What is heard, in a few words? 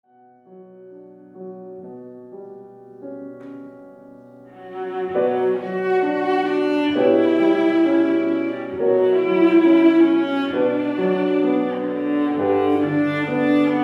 Schmetterling, viola mit klavier; trauermusik leipzig